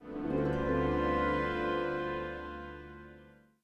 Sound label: Deception End 50bpm; asterix obelix slap them all 2; Music Dialogue